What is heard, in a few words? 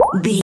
ui menu button beep